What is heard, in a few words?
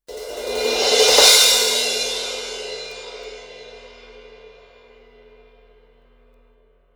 17 stage crash; sabian